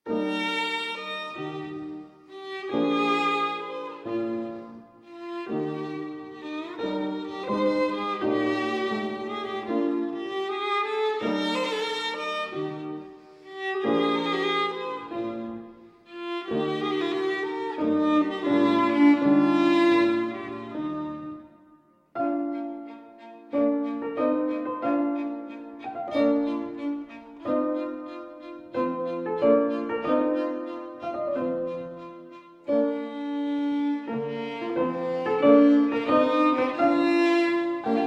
Violin Sonata No. 3 in G Minor, II. Andante

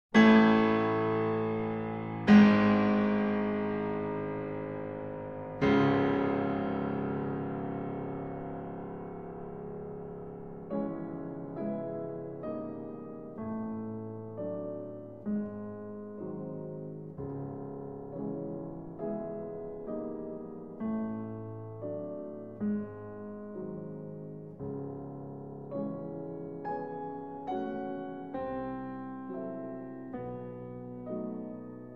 Prelude in C-Sharp Minor (Lento); 5 Morceaux de Fantaisie, Op. 3; Dmitri Alexeev

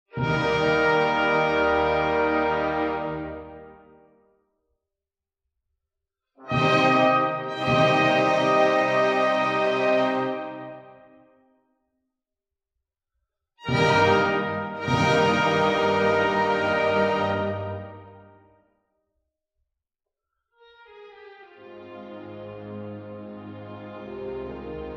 Overture; The Magic Flute, K. 620

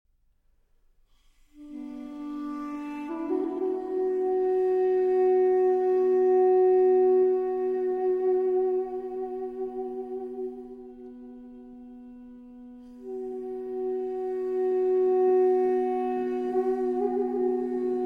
Lamento Ene Sarére (2 Duduk)

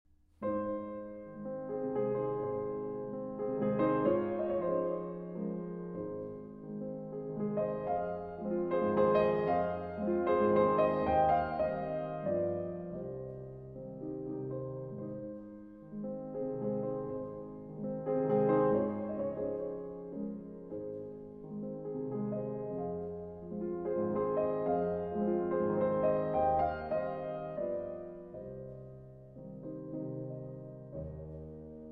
Waltzes in A-Flat Major

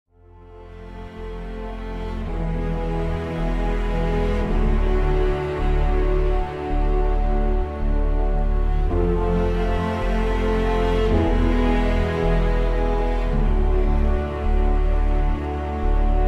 Instrumental

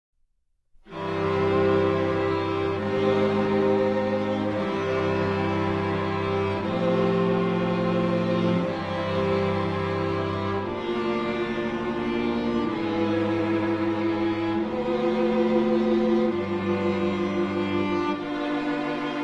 String Quartet

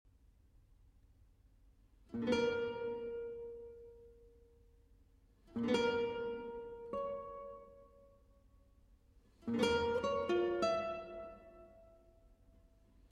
Sonata For Guitar Solo II. Adagio Molto; Elena Papandreou

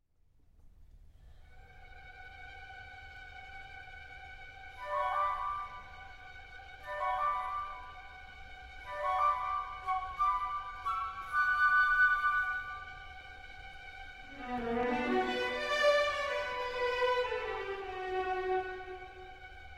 Barcarolle, Instrumental; Les contes d'Hoffmann, Act III; Belle Nuit, Ô Nuit d'Amour

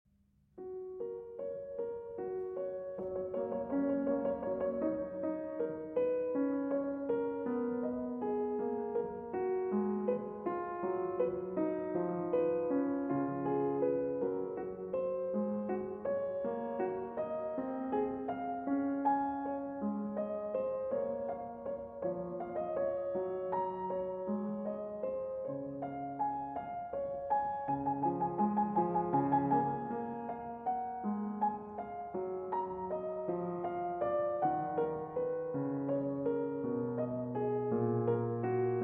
Prelude in F-Sharp Major; Prelude & Fugue in F-Sharp Major, BWV 858

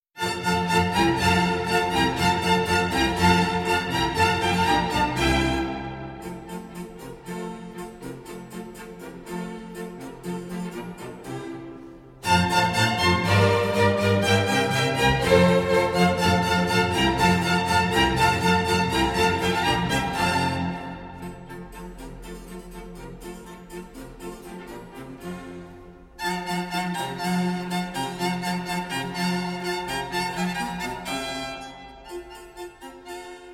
Violin Concerto in F Major, Allegro; Op. 8 Violin Concerto No. 3 in F Major, RV 293, L'Autunno. I. Allegro

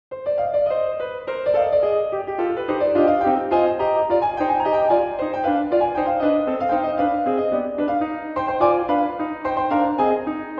Solo Piano, Improvisation; Madame Butterfly

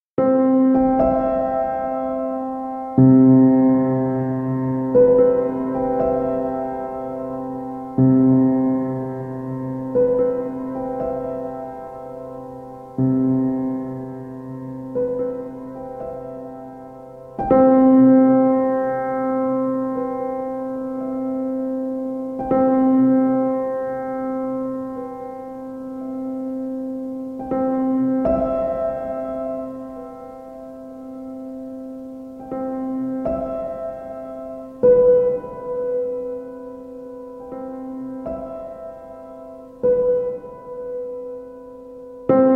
Piano Sounds

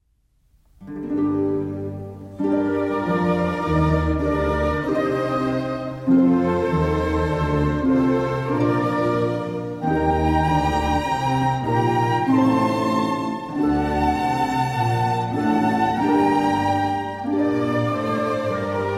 Concerto For Harp And 2 Flutes In B Flat Major

